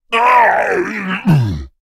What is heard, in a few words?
Creatures Fight Death; Mighty Party